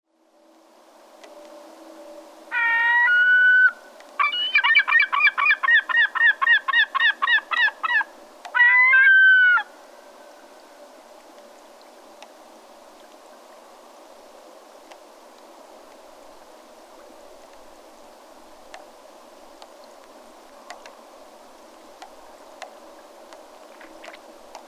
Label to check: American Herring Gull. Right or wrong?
Right